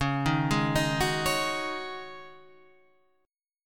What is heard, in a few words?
Db Suspended 2nd Suspended 4th, Guitar, Standard; arpeggiated; JGuitar